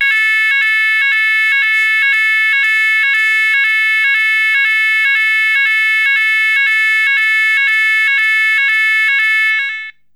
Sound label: Sounder